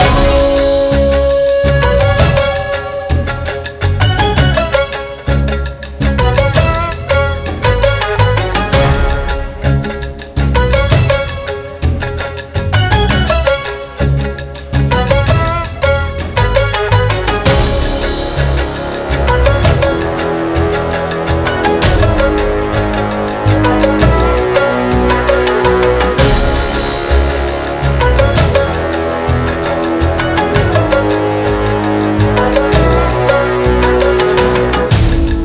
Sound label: Free Spin Music with Erhu; netent